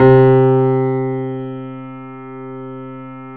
C 2, Keyboards Acoustic, GRANDP ST; USB Soundscan vol.17

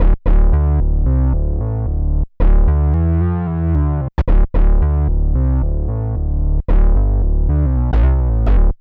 BASS LP

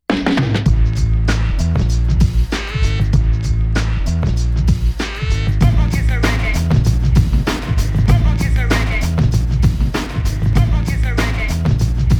97 BPM C, Hip Hop; InVision Interactive; 40 Oz Phat Slammin

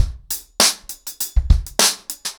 100BPM, ACOUSTIC DRUMS

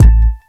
Bell Kick; Mo' Phatt; Digital Sound Factory Mo' Phatt

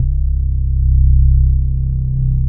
bass, 165 BPM, drum & bass; Ueberschall; Drum & Bass Resonance

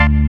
SYN DANCE; Sound & Vision - Gigapack II

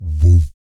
VOC PERC, Vocal Planet Jazz FX BASS PERC

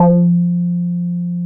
F3, Bass; E-MU Producer Series Vol. 6 – Analogia Project (CD 1); Boom da Bass 2

